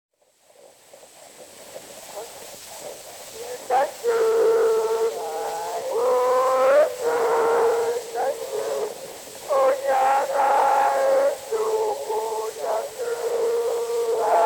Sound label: Zulu Recordings; Father Franz Mayr; Ihubo lempi